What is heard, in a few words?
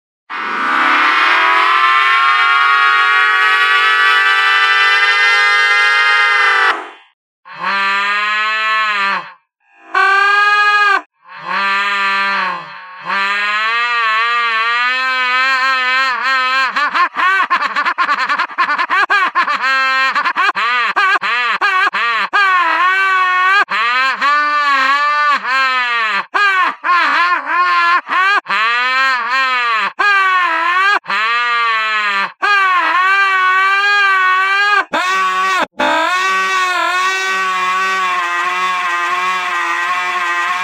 waaha; reverse